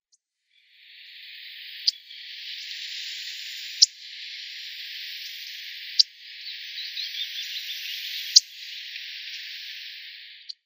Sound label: Grasshopper Warbler calls